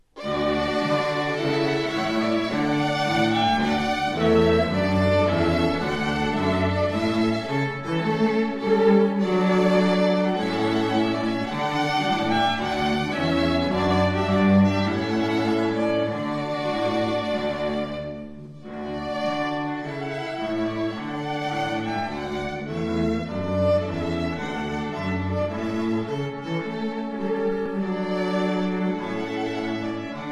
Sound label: trumpet voluntary, strings; clarke